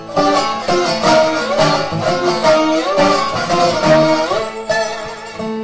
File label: dobro